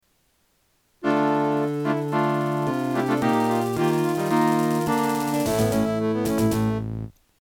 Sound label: sample adlib